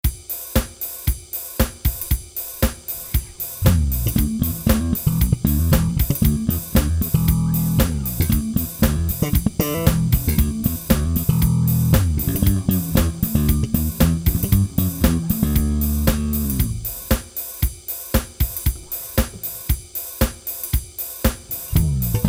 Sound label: SLAP